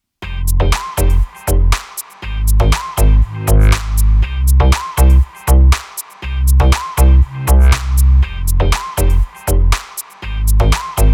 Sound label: deephouse; by burak sound bank; deep house vol 5